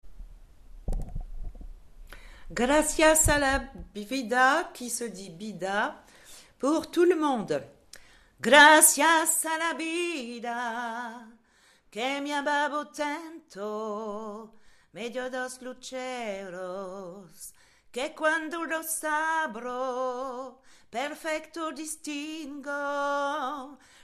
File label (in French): Gracias voix début pour tous